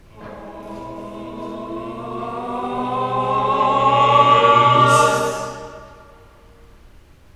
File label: Vocal Crescendo